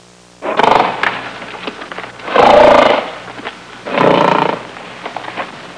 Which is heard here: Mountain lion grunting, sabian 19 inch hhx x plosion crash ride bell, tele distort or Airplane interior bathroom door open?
Mountain lion grunting